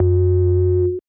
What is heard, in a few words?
Toned Bass; Big Fish Audio; Old Skool House; OSH Bass